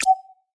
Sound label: primary button press